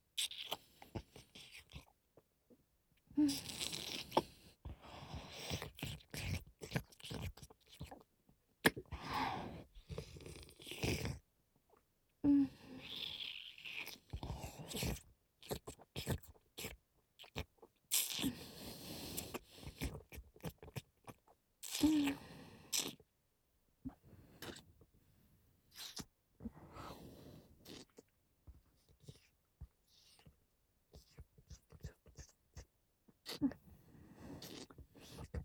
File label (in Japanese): 乳首弄りループ 乳首舐め; あなたにメス喘ぎを叩き込む、アルティメット乳首開発ダブル!!!